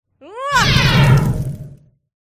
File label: mage female attack voice up